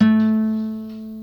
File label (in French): La2 guitare